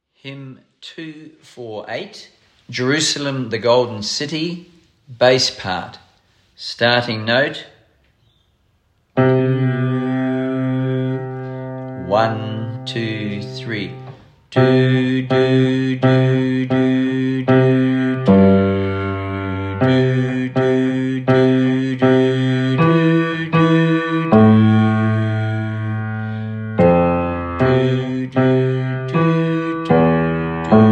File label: Bass SATB; Jerusalem, The Golden City; Hymns for Jubilation; in house choir